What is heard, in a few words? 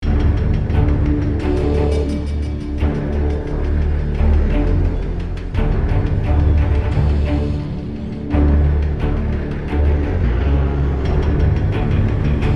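Music Rappel Descend Loop; Mx Rappel Descend Tier 01 Lp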